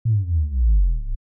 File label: Down Bass